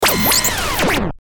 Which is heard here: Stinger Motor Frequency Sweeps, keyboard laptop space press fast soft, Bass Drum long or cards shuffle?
Stinger Motor Frequency Sweeps